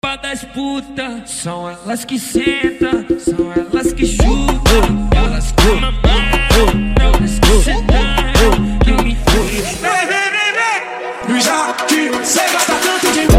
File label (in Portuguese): ELETROFUNK